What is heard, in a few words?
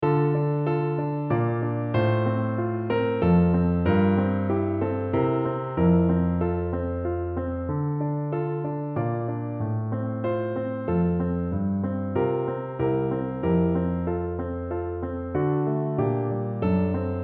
d min accomp; sebben crudele